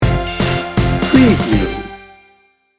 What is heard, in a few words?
motown sting bumper